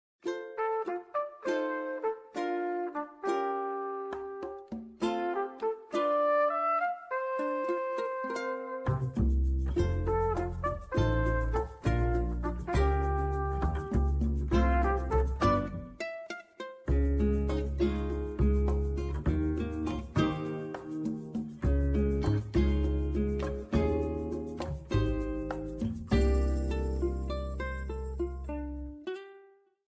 ringtone; animal crossing

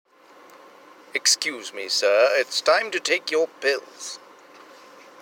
pill time ringtone

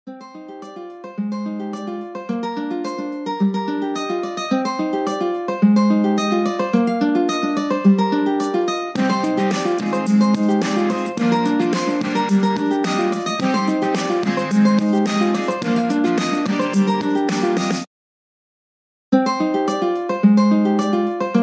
Xiaomi enthusiastic ringtone

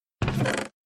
chest open ringtone; minecraft

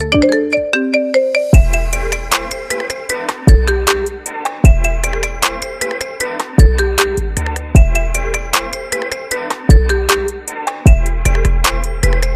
marimba remix ringtone

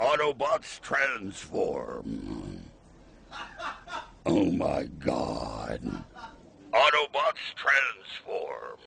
ringtone; autobots

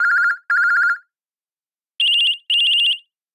sci-fi ringtone

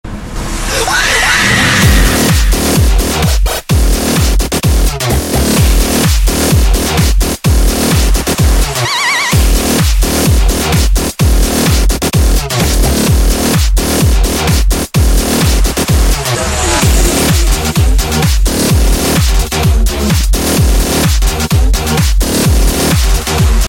electro hard ringtone

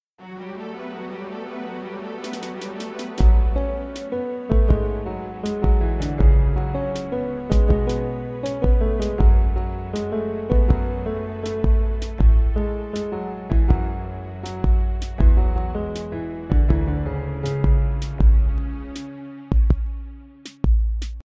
instrumental ringtone

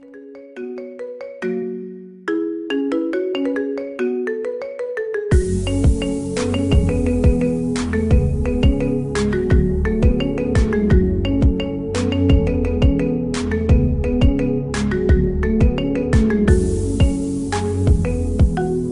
marimba remix ringtone

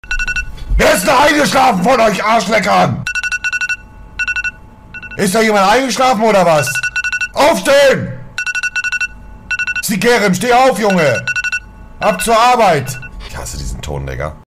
wecker ringtone; montanablack

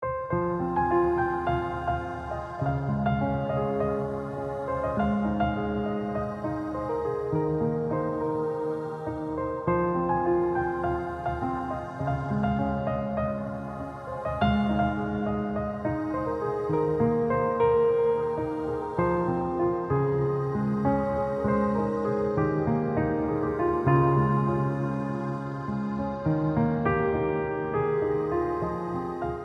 soft love piano ringtone

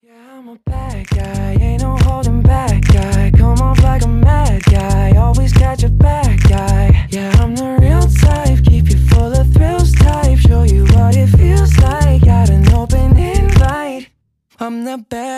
remix ringtone

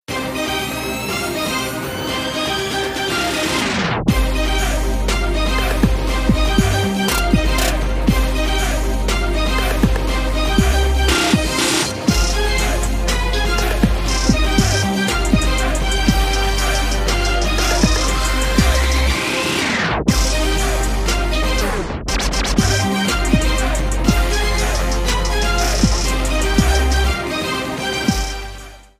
trap remix ringtone; spiderman

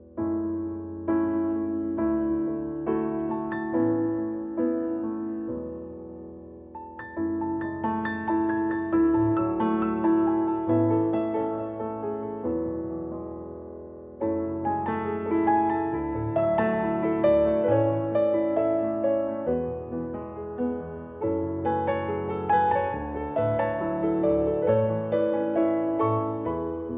instrumental ringtone

